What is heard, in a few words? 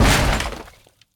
cannon hit; pirates